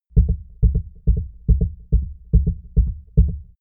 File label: heart beat fast sequence